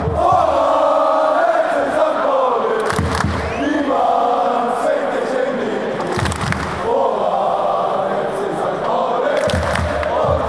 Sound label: ola, chants; fc st pauli